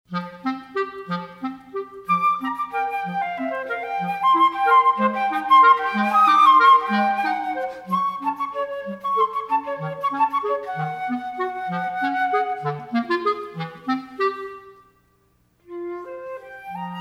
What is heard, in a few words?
Three Little Waltzes